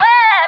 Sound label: "ah" SUNG, Voice; ooh ahh